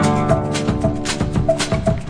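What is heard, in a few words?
114 Brazilian guitar conga; SAMPLED MUSIC LOOPS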